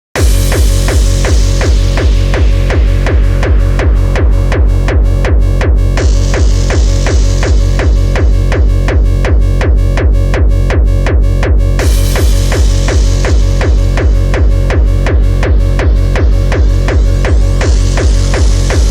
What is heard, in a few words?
Oriental Dark Noise